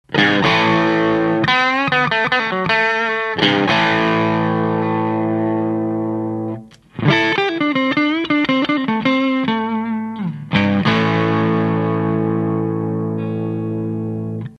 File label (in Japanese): OFF, 前半はストラトキャスターのリアPU 後半はフロントPUです; OLIVE DRIVE